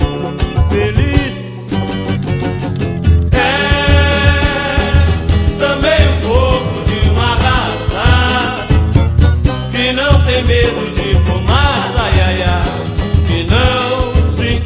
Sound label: break, SAMBA